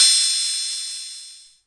Korg KPR-77; DRUM MACHINES

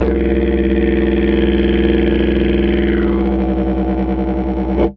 didg growl, didgeridoo; world

